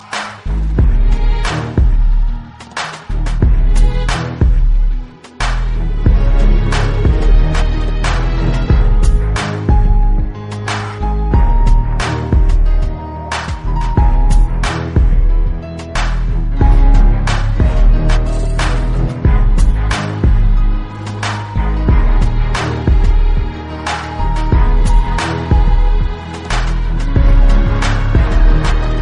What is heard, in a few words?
Beats